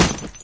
player ladder; Source Engine; dod

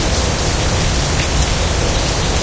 rumble rain, ambient weather; video game

